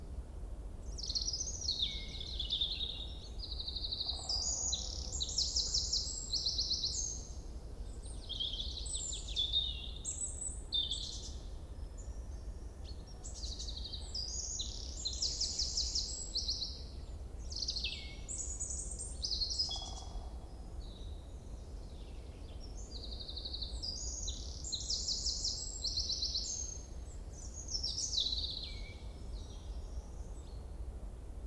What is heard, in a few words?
Calm Forest